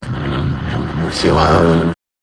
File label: jingle